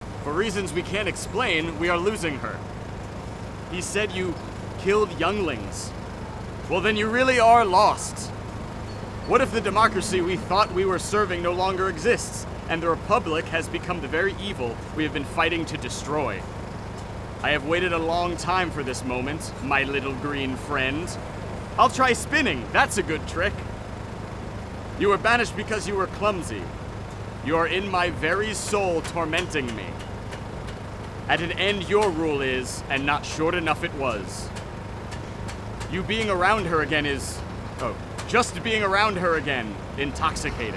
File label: Generator Boom; cedar-demo